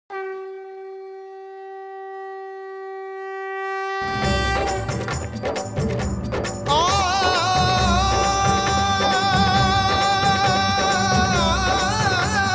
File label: Varmala Song Traditional; wedding